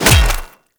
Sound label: Melee Hammer Impact Flesh; Hammers